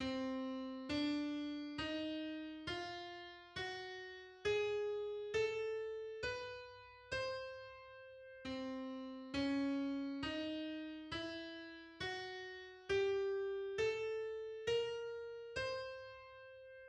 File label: Octatonic scales on C